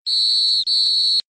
Pitido; mismarcadores

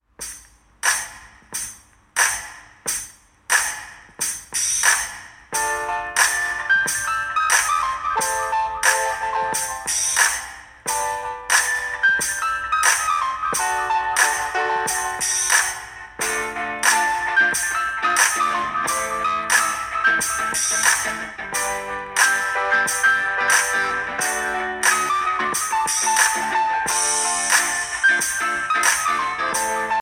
Jazz Muy Tranquilo

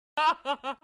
risa burla

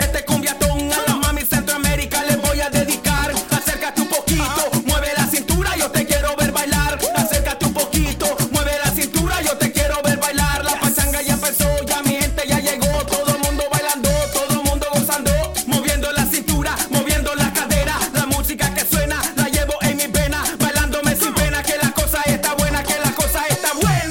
cumbiaton; latino

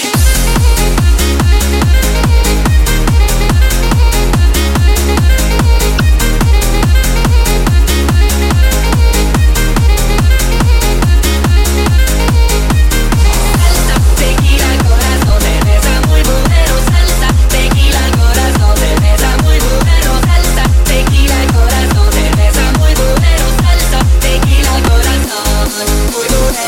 salsa; latino

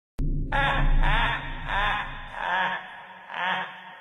Risa

